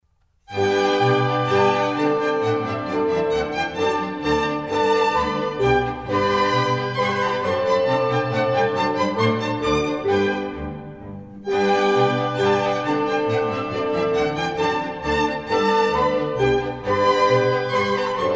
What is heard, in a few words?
German Dances, G Major; Mozart; Dances and Marches